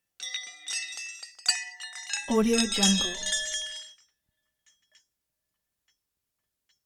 pipe crash concrete

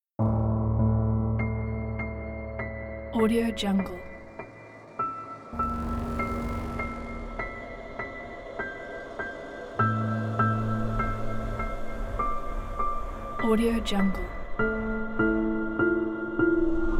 tense

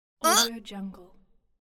girl grunt

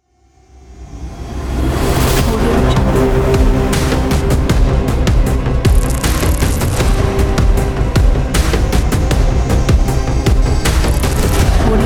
hybrid trailer logo ident

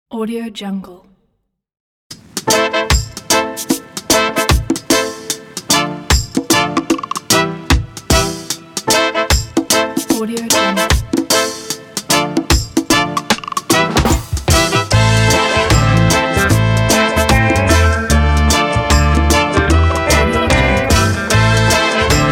reggae time

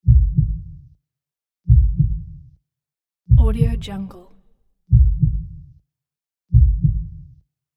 slow heartbeat

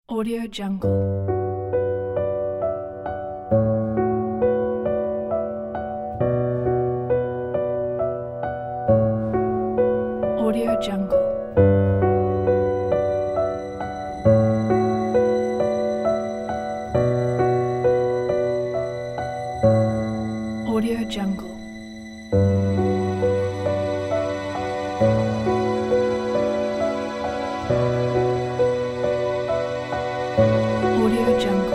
cinematic home piano

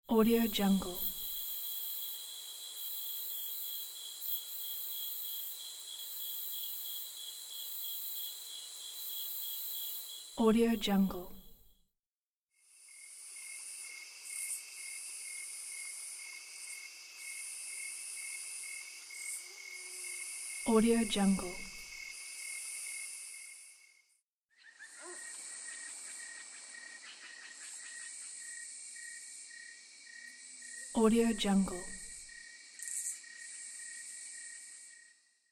nature ambience night in the woods; djsapa

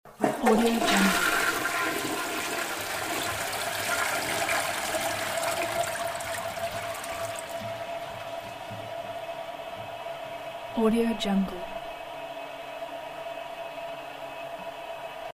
toilet flush; dellostudio

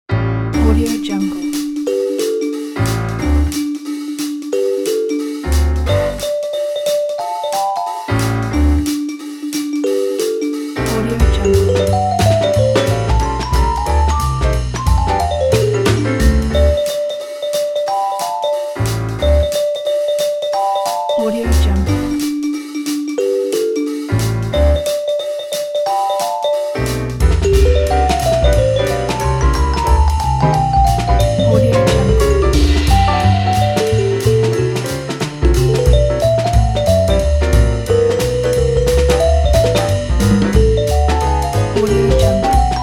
happy town

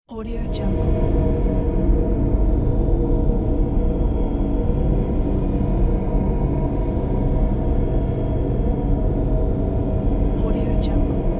drone

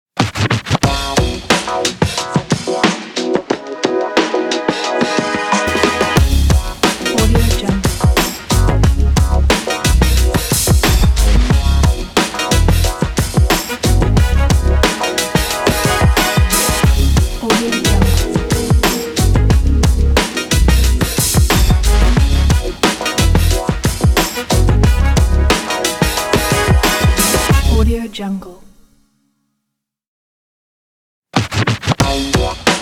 downtown stomp

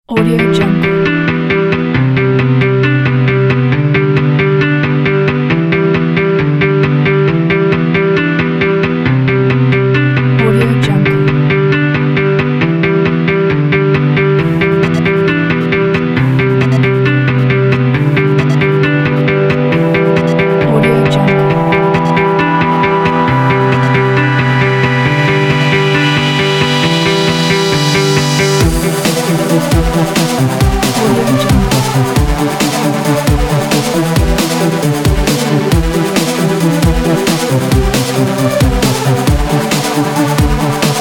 funny rollers

